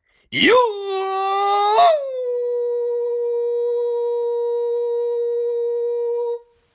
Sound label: voice iyooooo